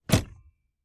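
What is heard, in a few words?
sedan emergency brake release; glove boxes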